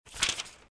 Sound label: Paper Forward Backward